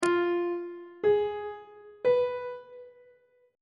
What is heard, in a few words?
f sharp melodic minor chord 7 is e sharp diminished on treble clef